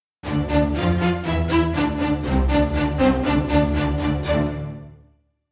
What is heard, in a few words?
Combat Out; Skellige